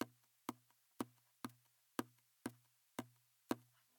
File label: Body Slap Fingers; Body Slap With The Tip Of The Fingers; guitarist